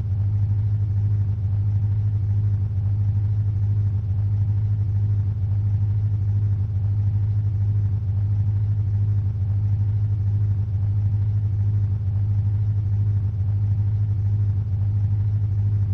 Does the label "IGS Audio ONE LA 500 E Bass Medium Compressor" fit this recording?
No